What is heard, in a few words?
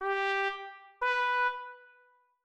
int up G B